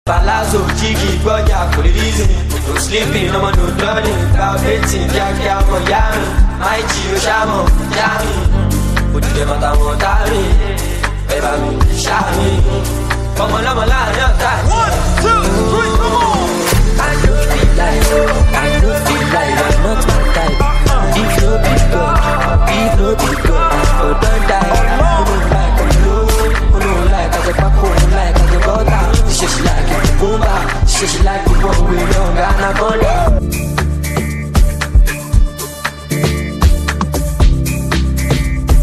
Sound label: Instrumental Hook